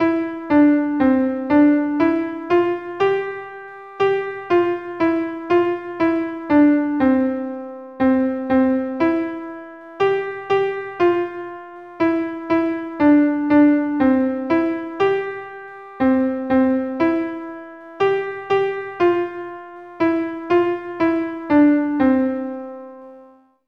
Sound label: piano